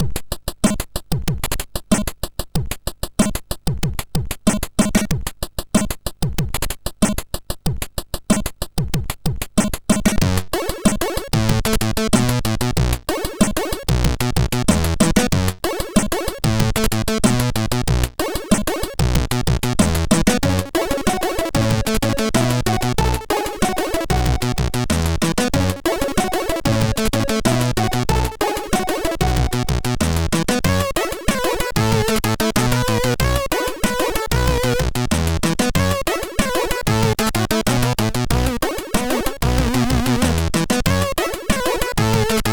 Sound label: Dub, MOS6581R3; hvsc